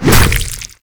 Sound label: Infested Ancient Tentacle Swing Hit; Warframe